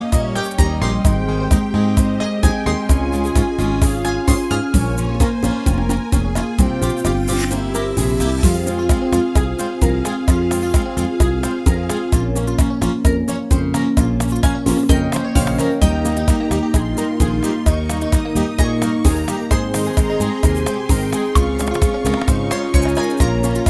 bachata

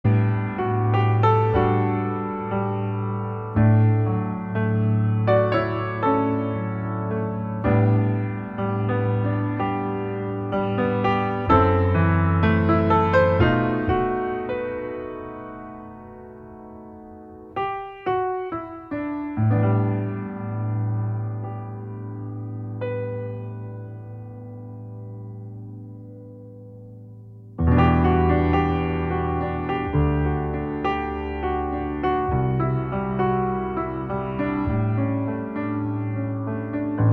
solo piano

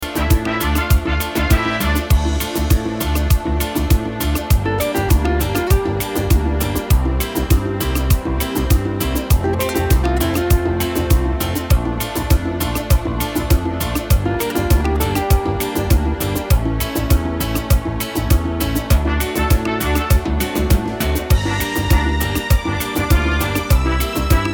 cumbia

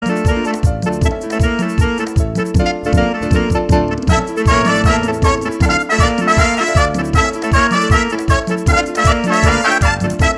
merengue

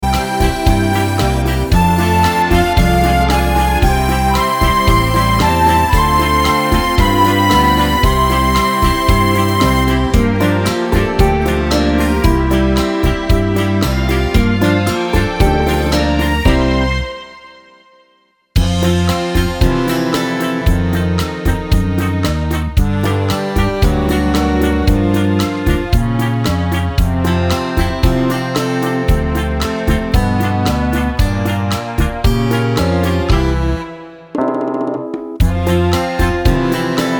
mix bachata

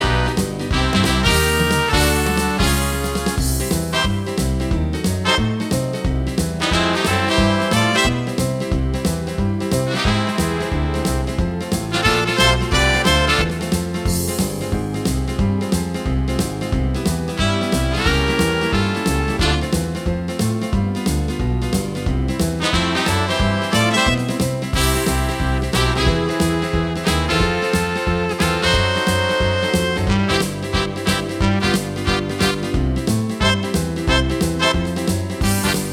swing

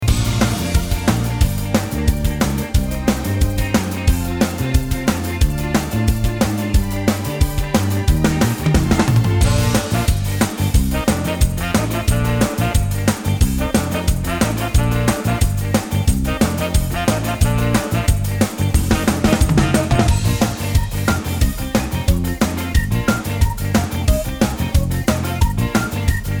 ska

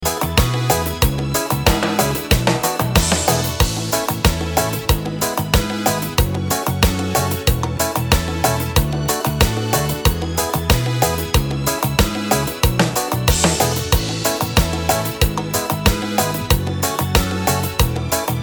cumbia

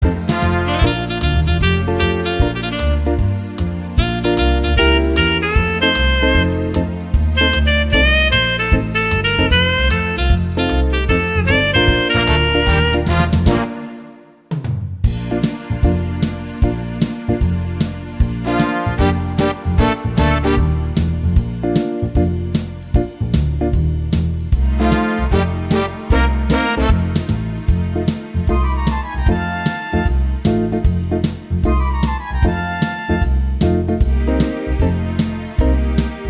fox trot

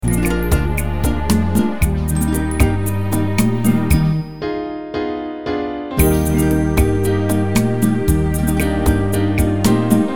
beguine

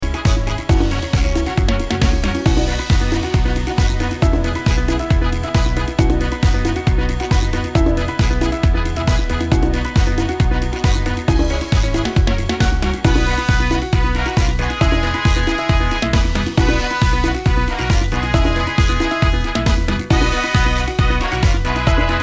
merengue

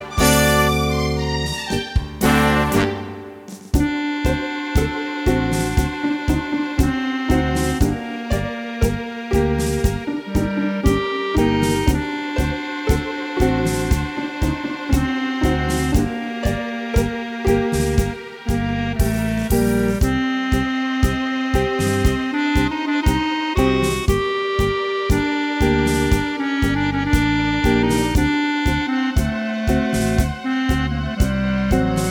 tango

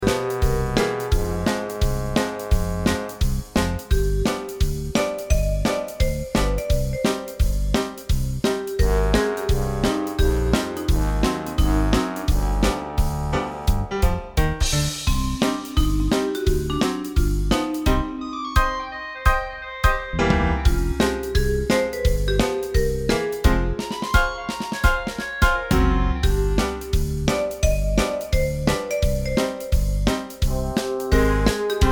swing

